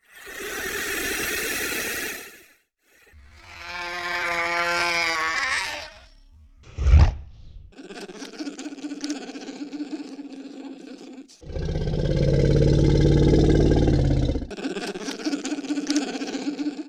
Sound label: Lizard Creature, Vocals - Monster; Sony Pictures Sound Effects Series